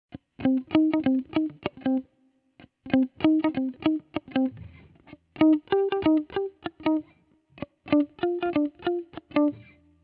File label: gt clean cable mute solo